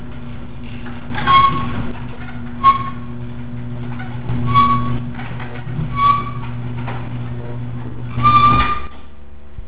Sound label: worn end bearing, garage doors